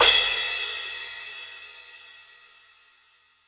crash

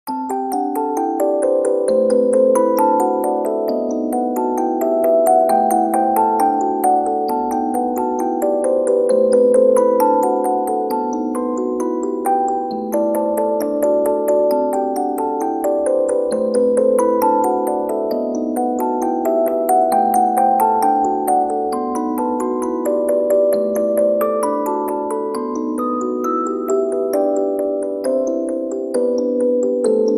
applause

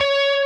prebend full Left-hand vibrato

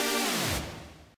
bass

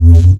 normal hit whistle; osu

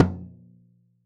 Studio Toms Hit

